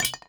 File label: mine metal